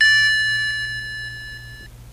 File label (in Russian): klavisha igrushechnogo pianino untuned